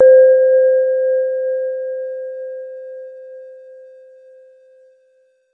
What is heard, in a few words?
wobble bell; Bells